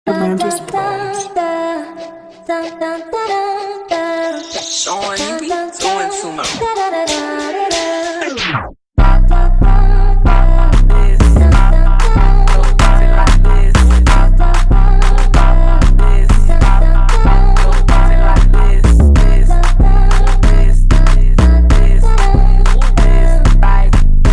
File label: Tam tam tam Tam tam taram tam fonk remiks